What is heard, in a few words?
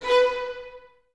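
Violins B 4 staccato right; Miroslav Vitous; String Ensembles; 11 Violins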